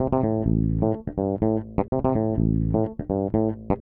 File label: DEEPHOUSE BASS 125 A